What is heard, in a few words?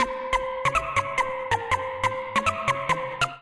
F Harmonic Minor 140 bpm, Percussion & FX; MIDI Clips; Tonal; Wile West; Ableton; Skitter and Step